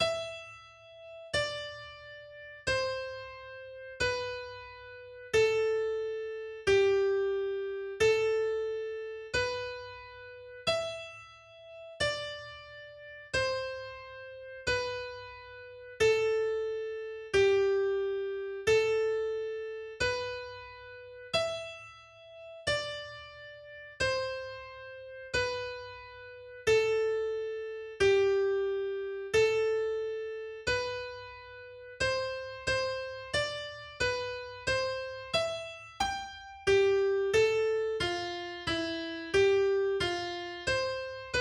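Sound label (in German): Canon in D Solo Akustikgitarre; Pachelbel; AkGit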